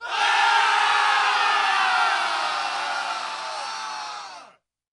battle cry; zombiesurvival

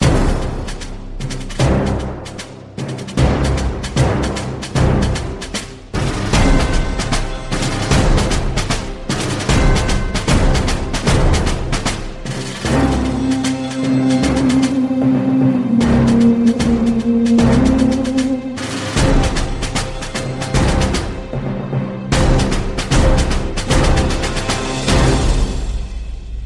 lost round; music; sudden attack; tfgo

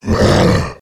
attack, male zombie; nmrih; zerosiki; slender

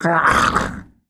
female zombie attack; nmrih; zerosiki; slender

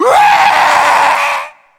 gorefast chase; kf2; gorefiend; slender

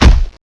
footstep sound; zerosiki; slender

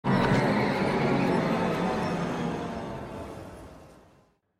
scare; smokey; scp cb; 173; slender

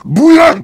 heavy laugh happy

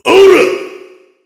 heavy meleeing

